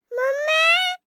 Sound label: BABIES TALK Boy 12 months Pitch Up Mommeyy; Baby Boom